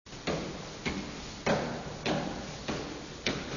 pas voute

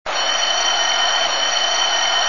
sonnerie

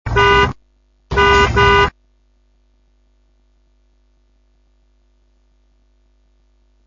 auto klaxon

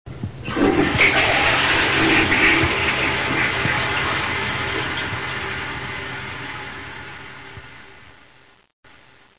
liquide chasse d'eau

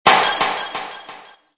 texture verre brise echo

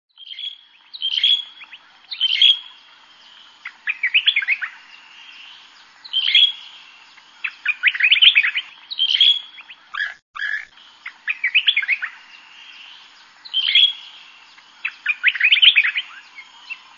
nature oiseaux matin

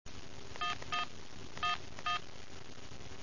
sonnerie distributeur

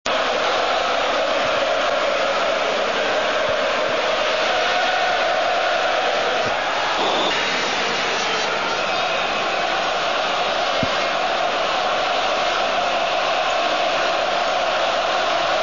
ambiance stade foot

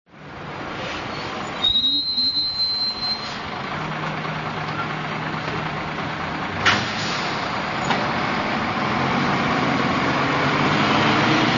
ambiance bus arrêt

